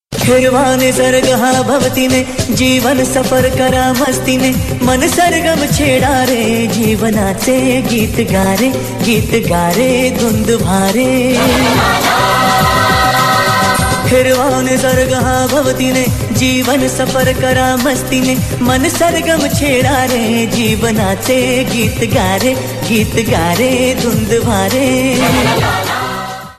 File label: Marathi Ringtone